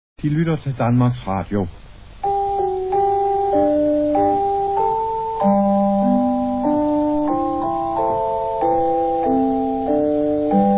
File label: interval signal; radio denmark c1978